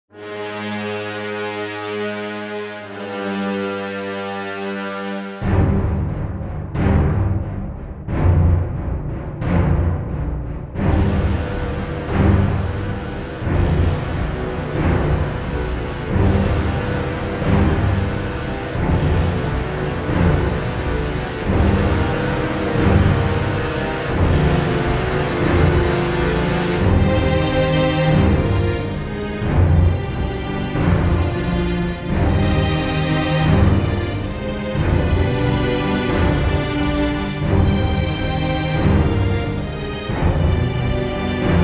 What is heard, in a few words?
Small Epic